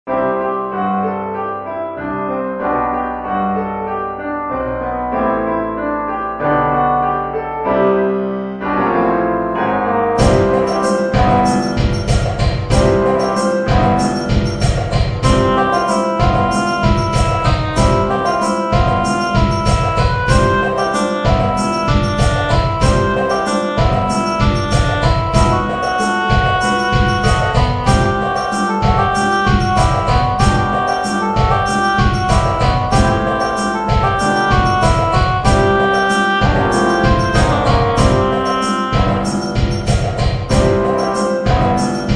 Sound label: Snow Bass Drum Practice